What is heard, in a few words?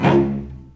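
C#1, VCS arco, strings, R; East West; The Ultimate String Collection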